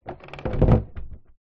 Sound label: sport surfboard longboard down on hard ground